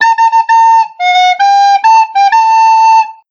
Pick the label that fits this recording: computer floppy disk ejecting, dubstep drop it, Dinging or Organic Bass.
Dinging